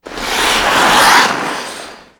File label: Waterski Wake; The Princess and the Frog; wii windows; Hub Swamp; Twitch Skijump